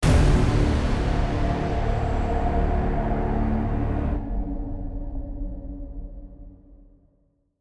Stingers sting combat boss

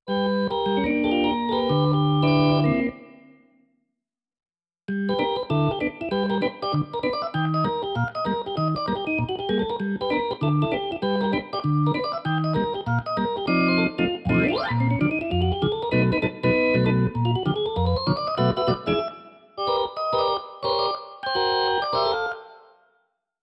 Plays the Organ; Tiny Thief; Bewitched

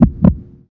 Heartbeat; friday the 13th killer puzzle

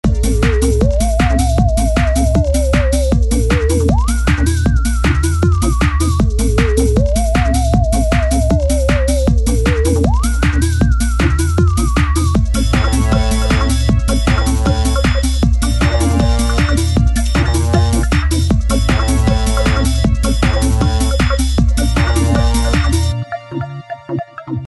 Loop; Spaced Out; Chaos Faction 2

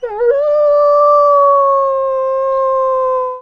wolf howl

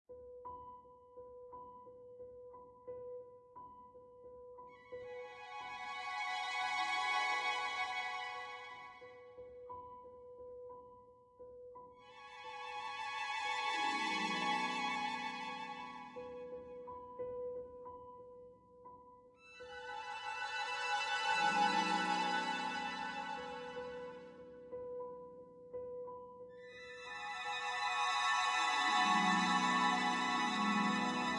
vocal novocal; Sky Children of the Light gamerip; OrbitRemake

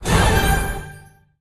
Line Blast; Candy Crush Soda

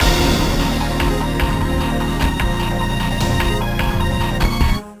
BGM STAGE BATTLE; Phantasy Star Zero

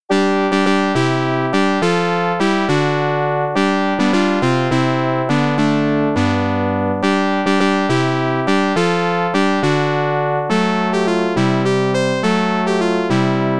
Villager Tarantella; ultima v x68000

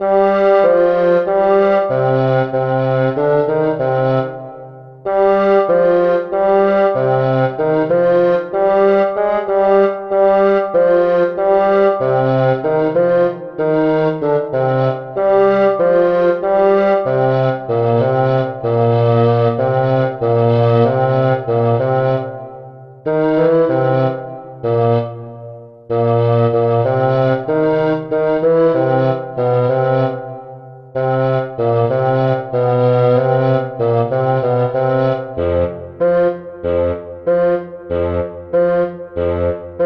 Flute

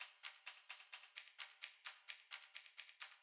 Electronic 130bpm Speed Verse Pre Chorus; Hot Lava

